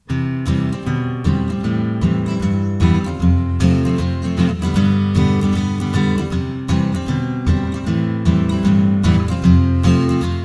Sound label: Slash chords example